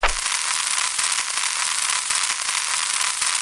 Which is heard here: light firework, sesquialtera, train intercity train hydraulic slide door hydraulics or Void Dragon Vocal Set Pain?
light firework